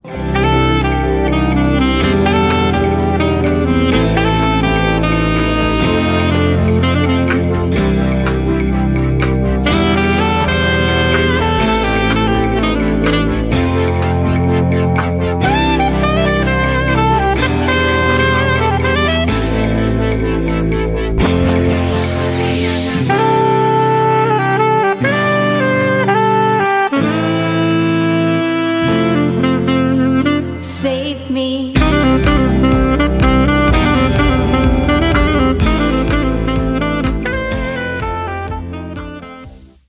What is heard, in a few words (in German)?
Saxophonistin